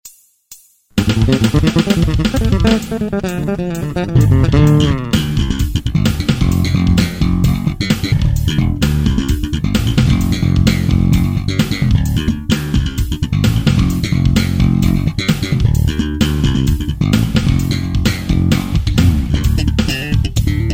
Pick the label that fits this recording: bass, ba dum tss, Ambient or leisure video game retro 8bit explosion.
bass